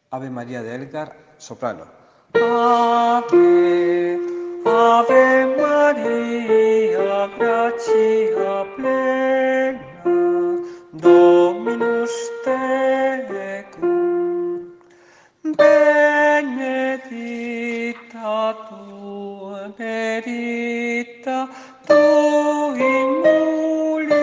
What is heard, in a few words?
avemaria sop; elgar